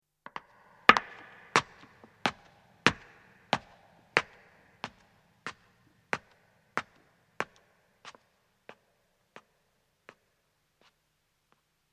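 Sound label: Footstep Stone Ascending, 1 woman departs; Human; BBC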